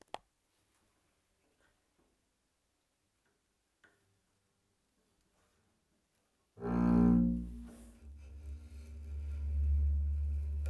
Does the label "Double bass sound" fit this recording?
Yes